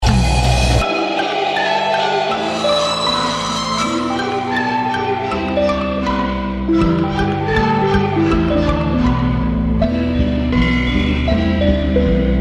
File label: Loop